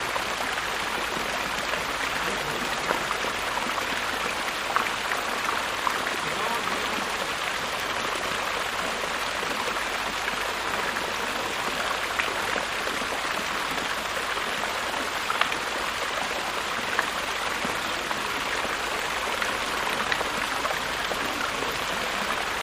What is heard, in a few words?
Streams Water Pool, fountains